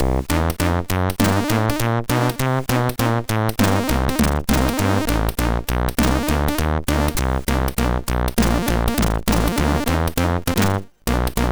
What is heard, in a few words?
Jazz, MOS6581R3